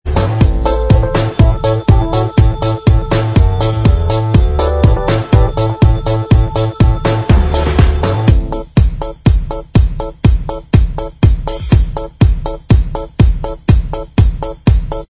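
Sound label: instrumental track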